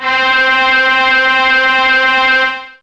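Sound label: Brass Section C4, Fairlight CMI IIe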